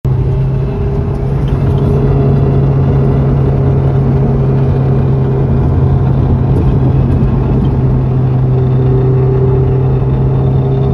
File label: clutch reservoir bubbling car sounds; s2000 under hood